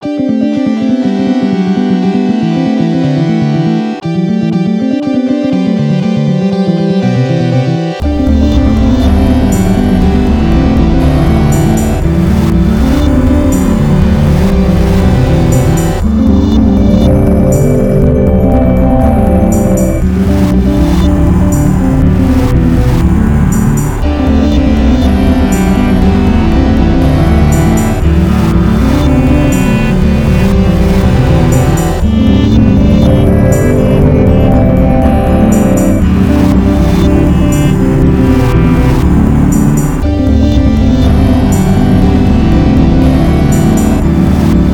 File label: drone